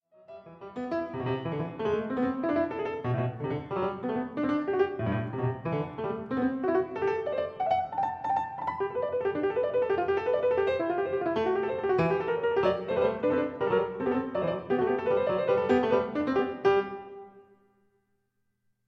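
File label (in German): Variation 20 für 2 Clavier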